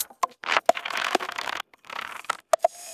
glitch error; sound effects

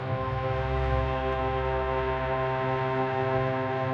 dramatic reveal, sound effects

